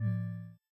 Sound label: ball leaving; kollision; games